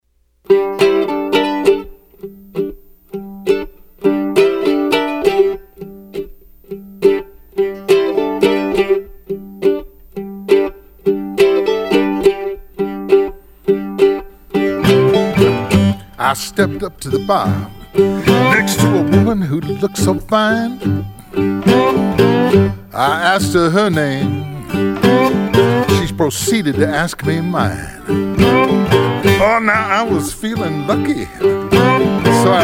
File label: Blues